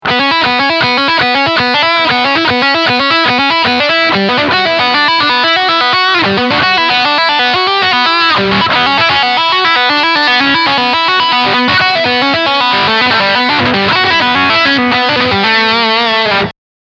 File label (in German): Lead Line Steg HB